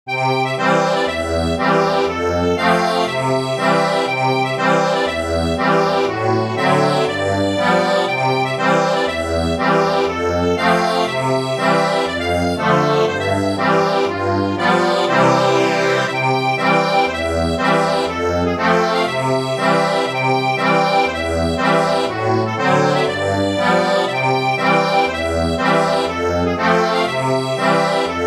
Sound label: ACCORDION